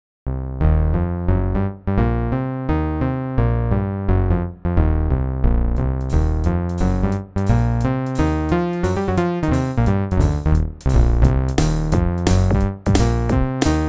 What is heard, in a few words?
Funk City